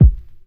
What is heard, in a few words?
Boom-Bap Kick; Kicks; drumkit